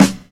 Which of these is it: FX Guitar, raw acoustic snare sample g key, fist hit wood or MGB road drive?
raw acoustic snare sample g key